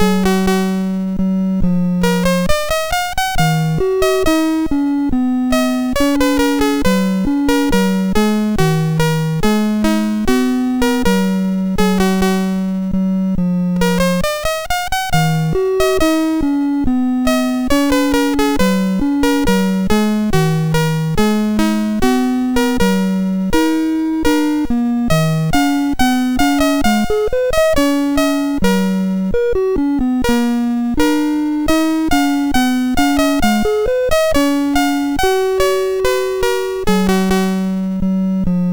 BASIC, MOS6581R2

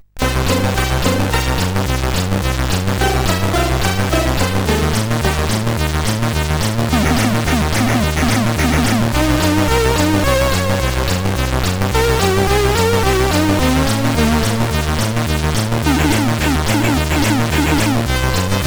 sid CSG8580R5; Moonshadow; hvsc

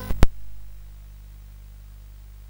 sid CSG8580R5; Gryzor; hvsc

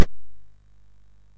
sid MOS6581R3; hvsc

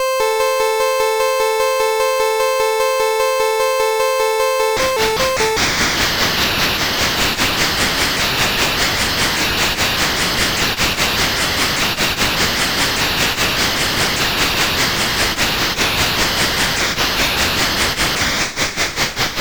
Train, CSG8580R5; hvsc

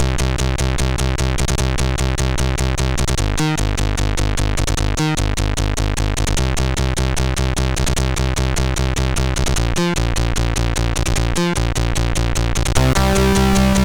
Metal, sid MOS6581R4; hvsc

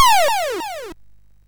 sid MOS6581R2; hvsc

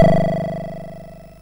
sid MOS6581R4; hvsc

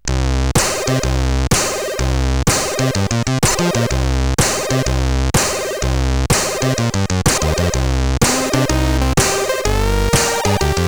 Metal Warrior Title Remix, sid CSG8580R5; hvsc

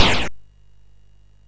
sid MOS6581R2; Gryzor; hvsc

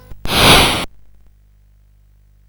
sid CSG8580R5; hvsc

